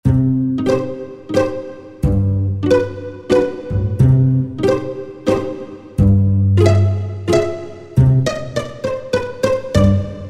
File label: Dynamic Pizzicato